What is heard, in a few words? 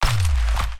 decision, button